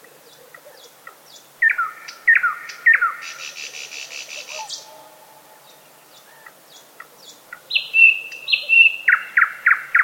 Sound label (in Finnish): satakieli